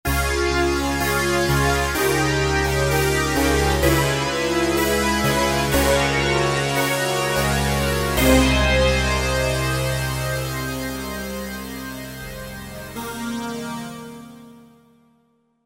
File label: Roland V-Synth; PVV Digilog; Factory Patches